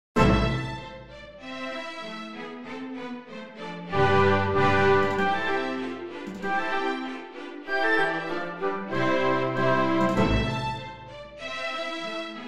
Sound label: Branle 16th century French Dance; drake a pageant play